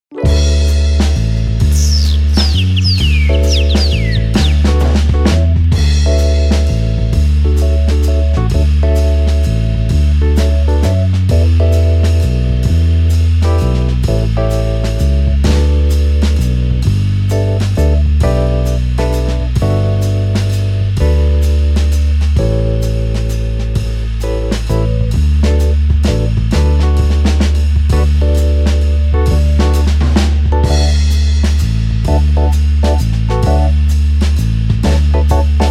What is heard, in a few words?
Karaoke Mix